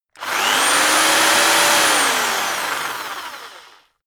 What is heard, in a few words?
rock drill rev medium long